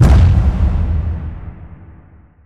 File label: stone remnant; weapons; dota; earth spirit